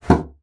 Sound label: bassoon slap; Xsample VOL-6; C BASSOON